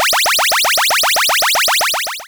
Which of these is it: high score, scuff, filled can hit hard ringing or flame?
high score